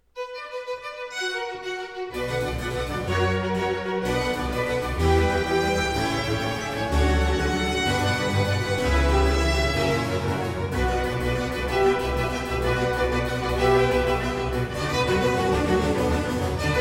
Concerto in C minor for strings and harpsichord III. Allegro; RV 119; A. Vivaldi; Concertos and Sinfonias; 尼古拉斯 · 麦克吉根